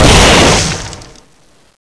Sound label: explode